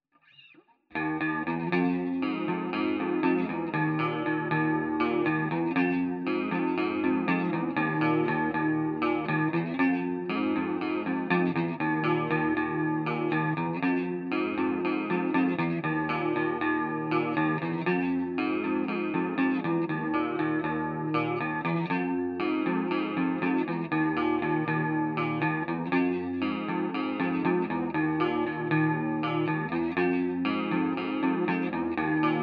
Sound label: HALF STEP CLEAN GUITAR